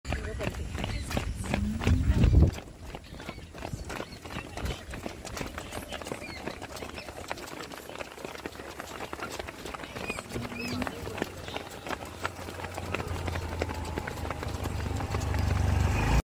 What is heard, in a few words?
walking with sneaker